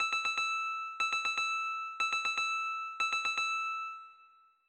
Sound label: ring, kitchen timer